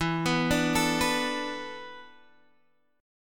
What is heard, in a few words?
E 7th Suspended 4th; E7sus4; arpeggiated; x,7,9,7,10,7